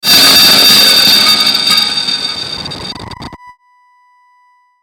Glitch Metallic Screech Electrical Hum, Glitch Robot Malfunction; Production Element Imaging Element Accent Transition; Blastwave